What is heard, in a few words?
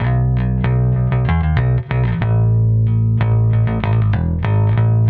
Electric Bass; Basses